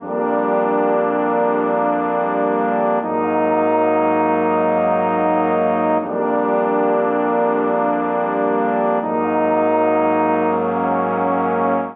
Progression Foggy Harbour Fsus Absus 80bpm, Brass Quartet; MIDI Clips; Ableton; Spitfire Audio